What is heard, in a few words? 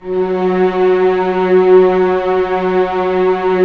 Vcs7 f Orch; STR Vcs 7 Orch